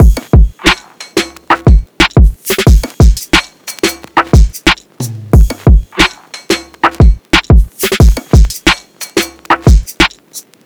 Drum Loop - 180 BPM

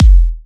Techno Kick

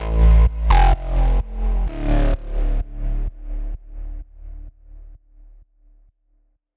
Bass Loop Robot Amin 128, Melodic Techno; Ghosthack; AC2024 - Day 12 - Melodic Techno

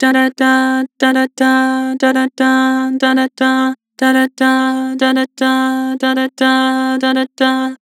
"Dah", Vocals; Harmor

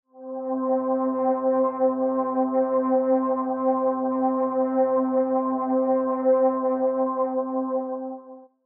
PAD (C); One Shots; Orchid; Suffer

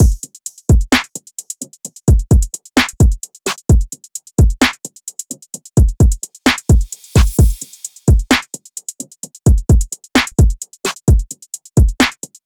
Drum Loop 130 BPM; Hunted; Cymatics; DOPE DRUMS VOL. 1

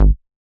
Squarehead Bass G#

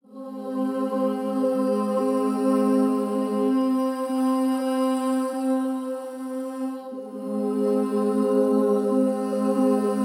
142 BPM F Min Choir, Drill; Loop Stems; Cymatics; Bastille

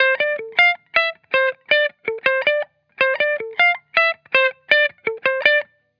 Hip Hop Guitar Loop - 160 BPM D Maj; Cymatics; Vibrations Guitar Sample Pack

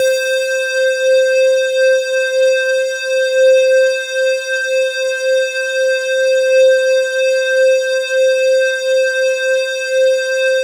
Jupiter-6 LEAD Squarey - C; Synth; One Shots; Cymatics; Fantasy Synth Sample Pack

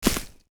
Footstep Grass Heavy; Footsteps

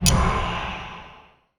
UI Shadow Select; User Interface

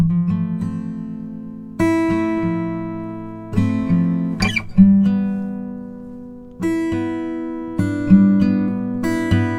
Xmass Guitar Loops 100bpm Dmaj; Guitars; Ghosthack; Advent Calendar 2019

